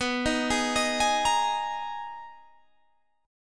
Eb Suspended 4th B; Ebsus4/B; arpeggiated

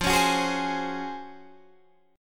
Db Major 11th F

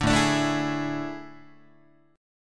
A Major 7th Suspended 4th, Guitar; AM7sus4; strummed; JGuitar

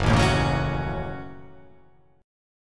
Gb Minor 6th, Gbm6 chord; strummed; JGuitar

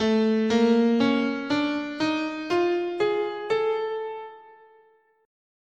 A Persian, scale

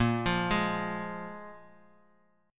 F Minor Double Flat 5th Bb

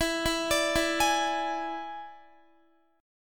E 7th E down Guitar; arpeggiated

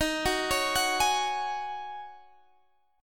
Db Suspended 2nd Suspended 4th Eb, chord

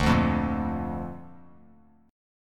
D Minor Sharp 5th D down Bass Standard; Dm#5 strummed; JGuitar

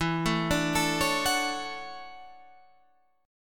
D-Major 7th E; Dmaj7/E; arpeggiated; JGuitar

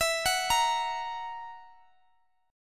F sharp 7th, E

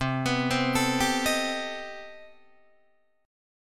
C Minor Major 13th C down Guitar DADGAD

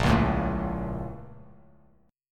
A sharp Minor Major 7th chord, down, Bass; A#mM7 strummed; Bass Standard; JGuitar

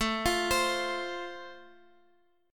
A Minor Sharp 5th A, Guitar, Open G

